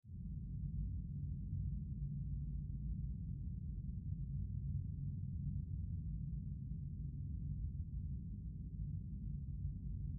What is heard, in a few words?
Cave SFX, Ambience; ENERGY